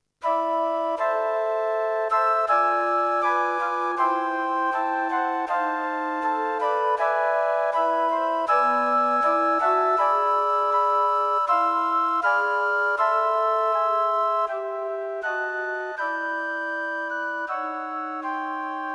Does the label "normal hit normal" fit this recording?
No